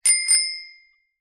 transport bicycle bell ring

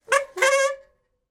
transport bicycle brake squeak

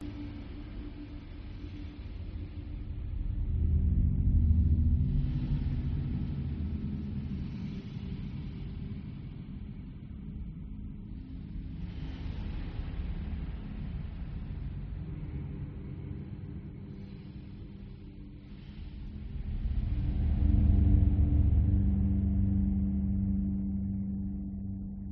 Soundscape; PMSFX

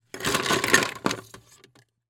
food drink scooping ice from ice bucket; martinimeniscus

